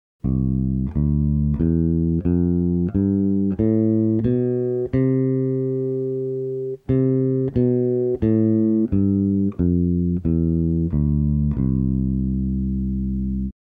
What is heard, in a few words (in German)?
ionisch